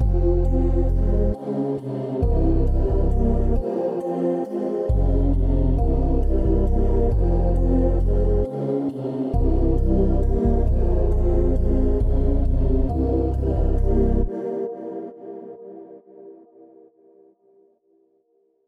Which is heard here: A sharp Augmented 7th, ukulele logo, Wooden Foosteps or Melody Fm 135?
Melody Fm 135